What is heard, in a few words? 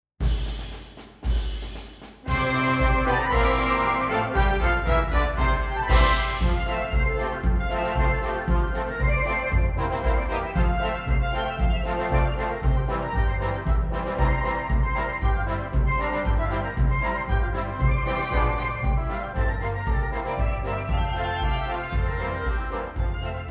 March